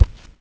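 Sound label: animal large right, FOOT; The Elder Scrolls III Morrowind GOTY; XBOX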